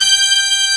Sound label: BRS, ROCK